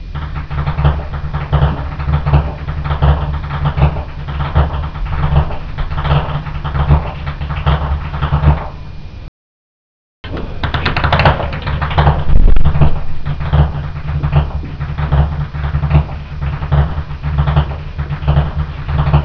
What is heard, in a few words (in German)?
schnalzen, jugend